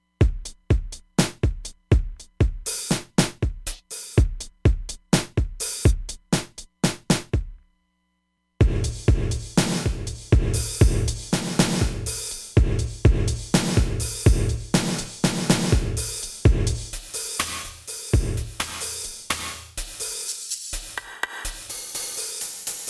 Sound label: Large Bright Room, Roland DEP-5; Factory Patches